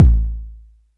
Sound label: BD